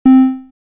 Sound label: Tristar, synth lead